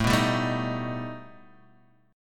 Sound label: B Augmented 7th A